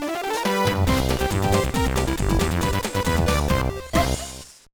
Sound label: Jingle; Momotarou Dentetsu G Gold Deck wo Tsukure; GBA